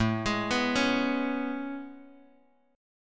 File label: A Major 9th A, Bass Standard